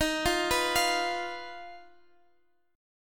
Eb Suspended 2nd Sharp 5th

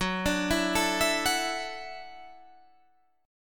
E 7th Suspended 2nd Suspended 4th, Gb, chord

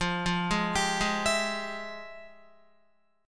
F Minor Major 9th, Guitar, chord; FmM9; arpeggiated; 12,8,8,12,8,12

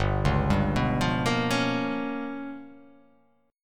G 7th Sharp 9th Flat 5th

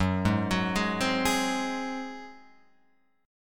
F sharp Suspended 2nd Flat 5th, Guitar, Open F; arpeggiated; 6,3,6,3,3,3; down

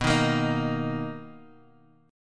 B Major 7th B down Guitar Standard; strummed; JGuitar

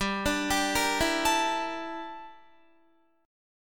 G Minor 6th Add 9th G down Guitar; Gm6add9; arpeggiated

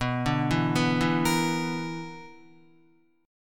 B-Minor Major 7th Double Flat 5th B down Guitar; BmM7bb5 arpeggiated; 4F,4A,5C,5G,5C,6E; JGuitar

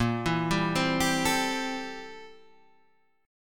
A Minor 7th Bb, Am7/Bb chord; Am7/Bb arpeggiated; JGuitar

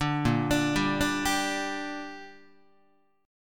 A sharp 6th, guitar chord; arpeggiated; 5,6,5,5,6,6; JGuitar

